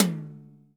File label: TOM F, Akai MPC2000